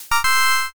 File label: next preview